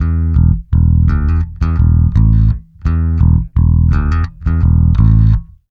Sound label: 084 Pop E-Bass Amaj; Pop Rock; Pro Tools Essential Loops; Digidesign